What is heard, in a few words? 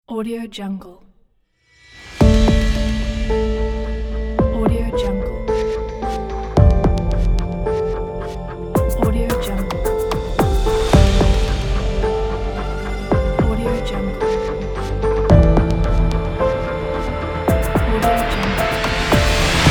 modren piano intro logo ident